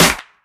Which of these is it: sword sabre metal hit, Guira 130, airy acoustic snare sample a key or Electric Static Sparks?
airy acoustic snare sample a key